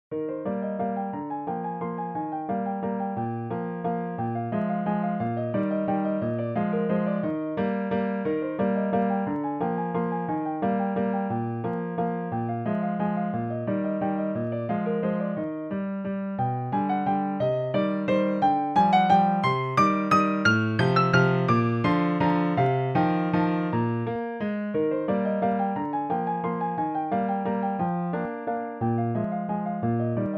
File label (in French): Valse Pour Piano; Ludwig van Beethoven